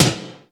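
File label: GATE tom; Crypton - DrumBase5000; GATE KITS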